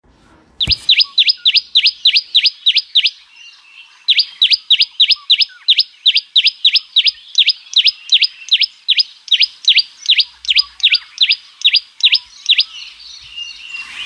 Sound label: Common tailorbird